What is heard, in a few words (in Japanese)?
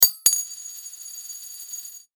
お金転がる